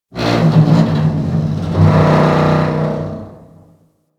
Corpus Ship Rattle; Ambience; Warframe; Corpus Ship Remaster

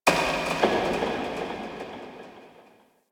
interior cave rock smash; Ambience; Misc Indoor; DE; Warframe; Infested Eris

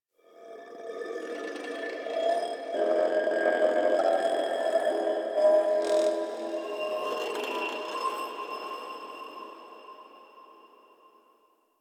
Glass Texture Med, Ambience; Warframe; Cephalon Weave; DE

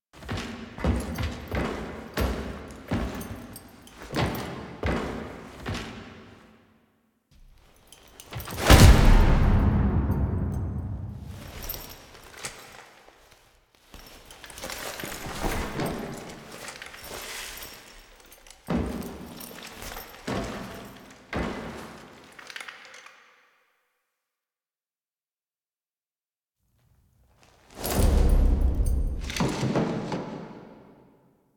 Cave Descent Foley; Warframe; Veilbreaker; Kahl

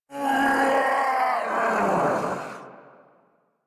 Attack Roar, Boss; Sargas Ruk

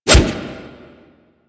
Wolf Swipe; Animals; Warframe; Quests; Duviri

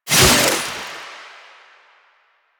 Stab Out; Kuva Lich Finisher Parazon; Warframe; Finishers

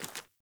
Sandals Walk Rock, Footsteps; Characters; Player

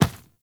Medium Run Default, Footsteps; Enemies; Warframe

